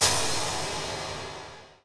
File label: frost hit; RYL